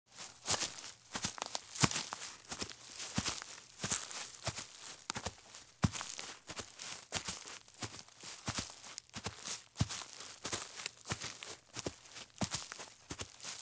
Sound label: Light Shoes Walk at Fast Pace in Forest; Sound Ideas; Footstep Sound Effects